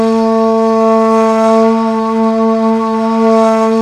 NEY FLUTE; Zero-G Ambient 1; FLUTE + SAX

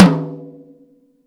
TOM, Drum Dry; East Collexion; Drum 1

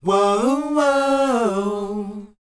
WHOA G#, Vocal R&B & Dance; ILIO; Vocal Planet

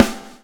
SWNG

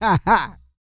GRUNT, MALE HUMAN; Spectrasonics Vocal Planet

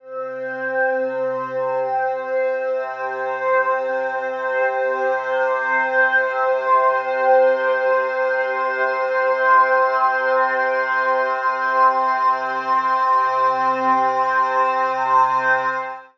Beauty Gliss C, Light; Zero-G Ambiosis

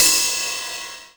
Ride Low, TR-909; Giga Samples Collection

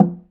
LO LOG DRUM; AKAI S-Series CD-ROM Sound Library VOL-5

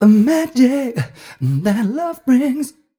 m 128 mel b-flat, Vocals

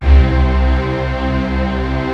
BIG STRINGS; Kid Nepro; Elite

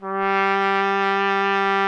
BRS TP G 0, Trumpet; Miroslav Vitous

